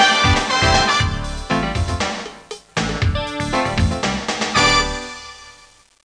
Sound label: Music End